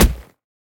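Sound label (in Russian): глухой удар кулаком по книге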